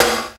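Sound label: electronic rim; Best Service Hyper Dance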